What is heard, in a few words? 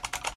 click; Electronica Digitales; logic gates